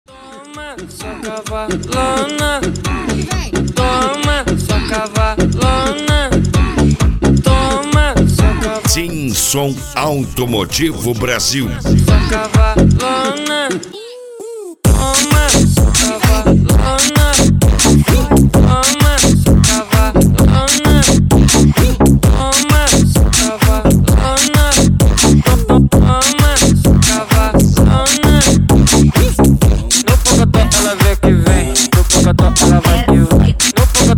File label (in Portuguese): funk tum dum